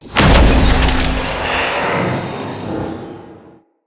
Galvanic Outlet Power Up

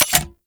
Heavy Grenade Launcher Mech; Warframe; Archwing

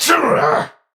Pain Heavy; Tau; Dax; Battle Barks

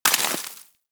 Bullet Impact Debris Snow; Warframe; Weapons; Bullet Impacts

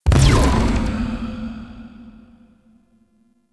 Corruption UI Debuff; Warframe; Missions; Zariman

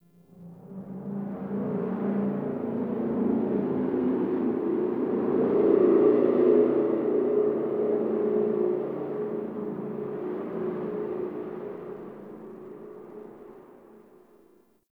Dark Wind; Warframe; Ambience; Nineteen Ninety Nine

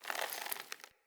Squid Plant Snore; Traps

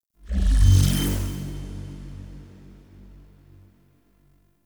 UI Default Theme Login Success

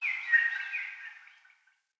Wetland Forest Day Bird Distant; Midrath Island; Ambience

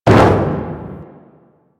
Metal Barrel Impact; Nineteen Ninety Nine

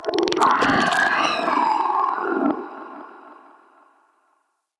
Shrimp Vocal Alerted, Creatures; Baliq Shrimp Glade

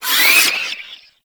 Hand Bomber Proj Warning; Warframe; ManInTheWall

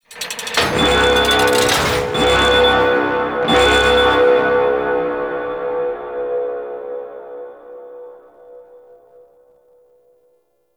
Alarm Sequence; Warframe; Tau; Cinematics; DarkRefractory

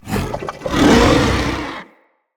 Corrupted Battle Dog Charge Start Bark; Animals; Vocals

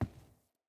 Grunt Boot Walk Wood, Footsteps, Boots; Enemy; Sinecure; Melee Grunt

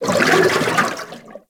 Coolant Tank Step; Warframe; Corpus; Arachnoid Coolant; Steps

